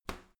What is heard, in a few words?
garbage container light lid shut soft